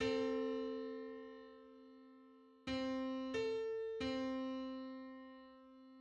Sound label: Four-hundred-thirty-seventh harmonic on C